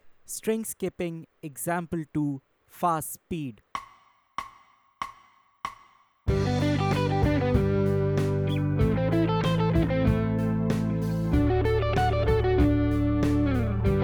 String Skipping Playthrough Fast Speed, lead guitar; string skipping arpeggios; The Art of Lead Guitar